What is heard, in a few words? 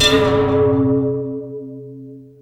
BELL; Back In Time Records; Big Bang; TDP+BELLS2